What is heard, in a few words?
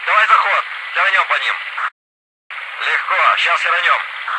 hit, helicopter; vehicles